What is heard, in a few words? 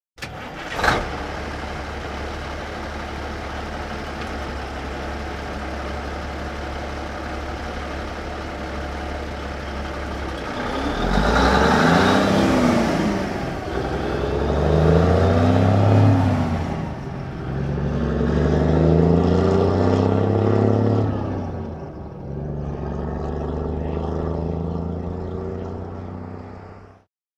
Big Rig Ext Start Idle Fast Away With Shifts; Sony Pictures Sound Effects Series; Period Vehicles